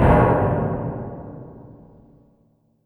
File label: big clank